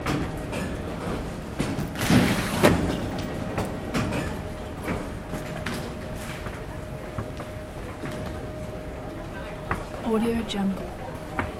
ambiance transportation baggage reclaim hall italy loop; blazznet